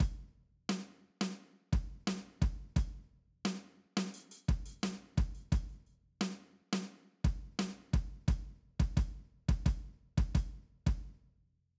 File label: Loops